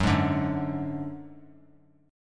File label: Gb Suspended 2nd Sharp 5th 3C,3F,3A,3D; Gbsus2#5 strummed; 18,15,17,16